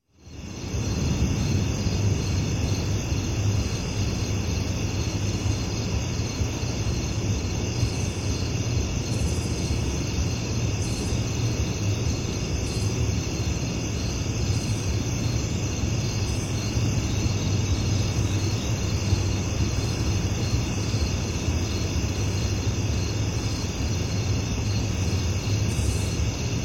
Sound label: Residential Daytime Crickets And Grasshoppers; Ambience; Sound Ideas; Series 2000